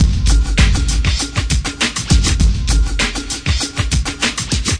100 layered thick and heavy, drum loops and breaks; 091 to 100 bpm